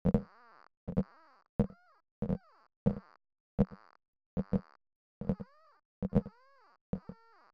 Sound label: synth creaking wood, walking sounds; The NIW Project